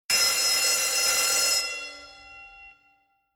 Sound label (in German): Anlage Start; Doppelmayr